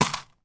household pins glass headed container drop on floor; sound effects one